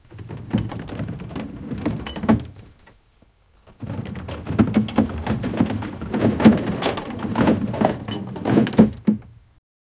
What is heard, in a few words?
the sound of a wooden, rattling